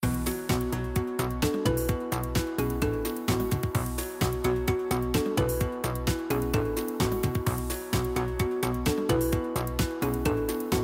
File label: BGM Bonus; Oriental Ink